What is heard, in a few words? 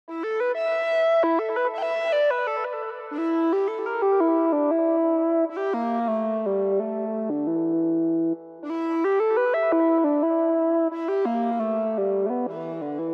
analog lead flute; tutorial